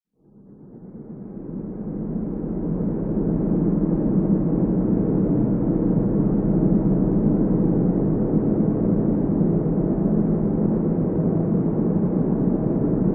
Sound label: sound design drone medium pitched constant ambience; zapsplat